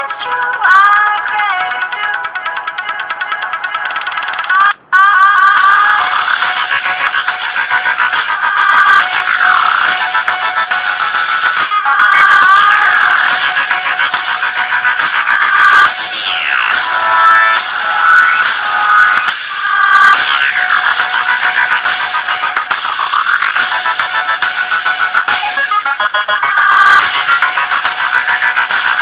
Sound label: Most Awesome Dubstep